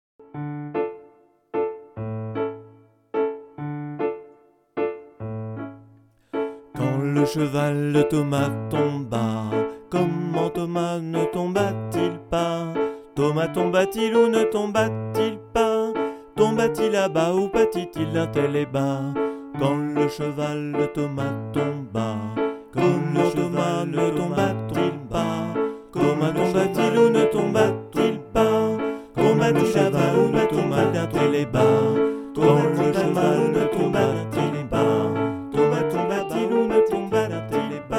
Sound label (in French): canon piano voix accompagnement court